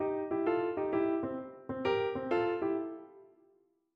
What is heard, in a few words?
Blues Piano Lick